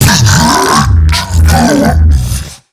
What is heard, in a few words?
Hand Bomber Death; Warframe; MiTW; Battle Barks